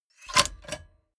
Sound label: mechanics small snap